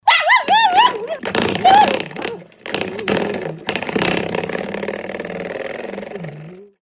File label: chihuahua playing with door stop